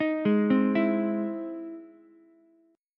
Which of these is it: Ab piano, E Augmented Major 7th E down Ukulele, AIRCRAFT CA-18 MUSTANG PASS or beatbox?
E Augmented Major 7th E down Ukulele